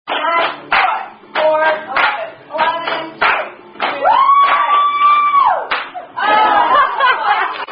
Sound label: Zoomy Zoomy, Drinking Game